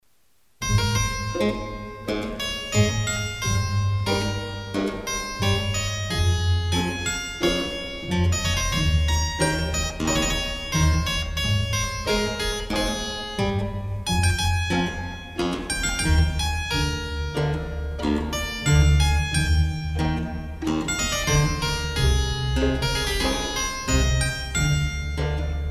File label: Harpsichord Concerto In F Minor, Largo; Johann Sebastian Bach; Bach- Air & Badinerie Orchestral Suites; BWV 1056